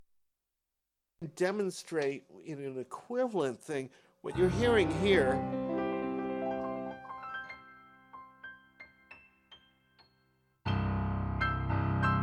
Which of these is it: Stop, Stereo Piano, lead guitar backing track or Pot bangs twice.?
Stereo Piano